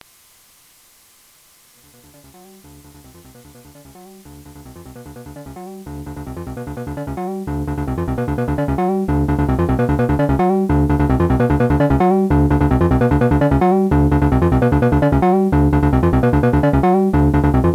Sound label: RINGTONE